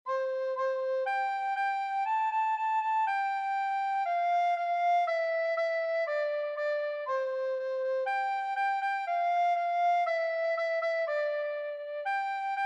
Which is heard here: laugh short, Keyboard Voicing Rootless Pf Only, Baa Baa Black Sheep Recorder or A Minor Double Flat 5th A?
Baa Baa Black Sheep Recorder